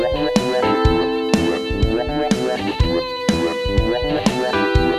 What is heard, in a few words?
only drums